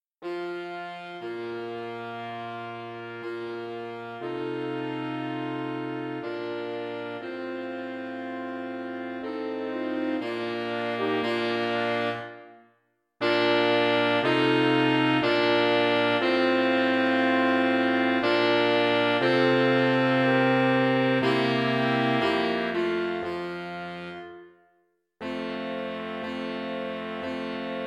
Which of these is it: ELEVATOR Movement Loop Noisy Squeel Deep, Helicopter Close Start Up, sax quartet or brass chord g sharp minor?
sax quartet